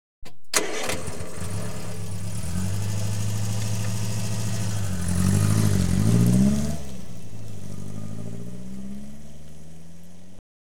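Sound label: Ford Fiesta, 1-1 L Hatchback, Exterior; Auto; BBC Sound Effects Library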